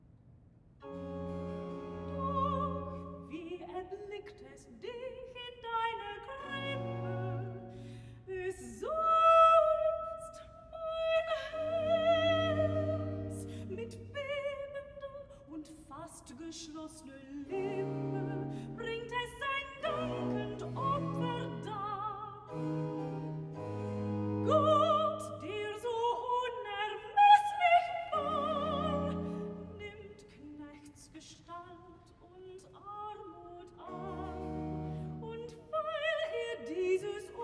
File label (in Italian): Recitativo (Sopran); Cantata, BWV 121 'Christum wir sollen loben schon'; V. Recitativo (Sopran) 'Doch wie erblickt es; Bach; New York; Xmas Day + 2nd Day of Xmas